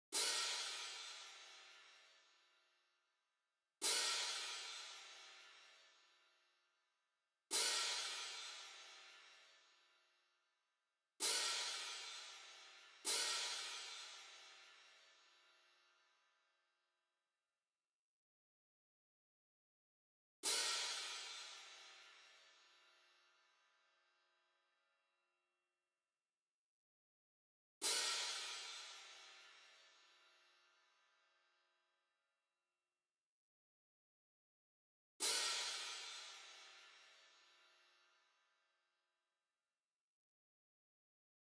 Orchestra Cymbals; McRoMusic; Summoning Circle

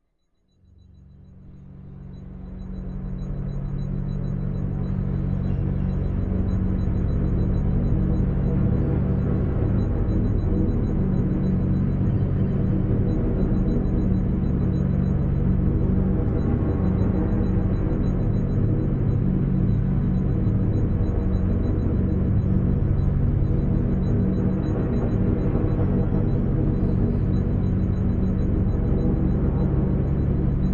spacecraft engine; sonniss gdc

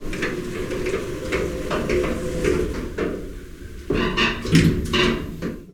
elevator mechanism wide; sonniss gdc

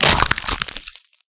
zombie massacre head smash; corpses

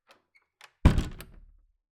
wooden close clean, doors

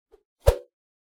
meleeattack swoosh light, weapon swooshes; psfx

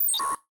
ui computer; thelibrarybymtc

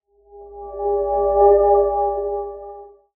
dancing lights, cantrips

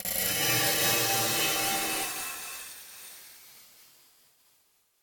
upright piano fx atmospheric element surreal; sonniss gdc

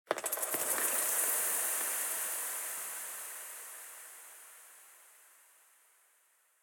paper; sonniss gdc

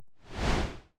ranged magic energy strands; 05ft; psfx

